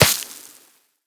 sand impact bullet

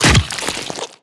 bite pull, barnacle creatures; Half Life Alyx

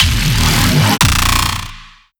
shockwave rise bass

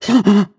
breath short, zombie

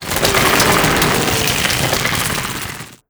getup roll, zombie revived

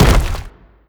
bash soft, brickwall, destruction

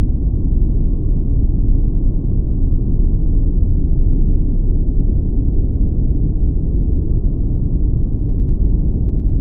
interior; ambient; Counter Strike Model Pack; CS:GO; survival

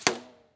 bullet ric; weapons; rics; Counter Strike Model Pack; CS2

